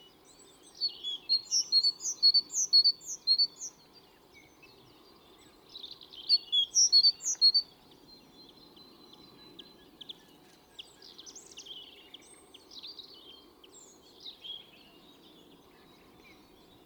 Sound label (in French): chant mesange charbonniere; la mesange charbonniere